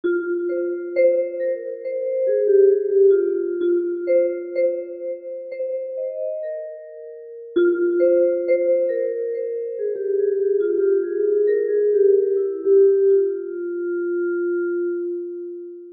Wibrafon

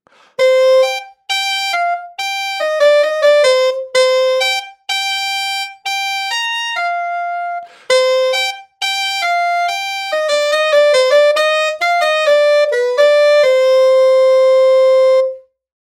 Saksofon sopranowy

